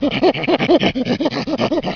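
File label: chimpanzee; Canon CameraWindow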